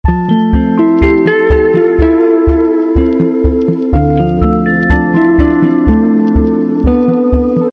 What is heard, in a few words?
iphone chalet ios 17 new alarm